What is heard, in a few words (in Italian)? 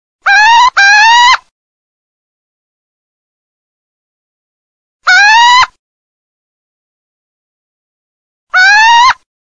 CIVETTA, birdsound